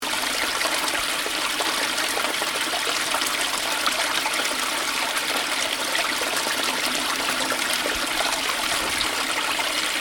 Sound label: Stream gentle loop, Stream: gentle water running, loop; Nature